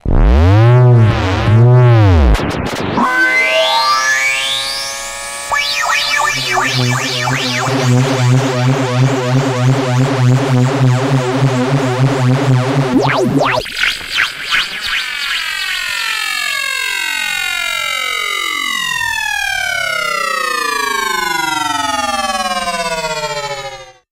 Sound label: long series of various zaps; Zaps and Beeps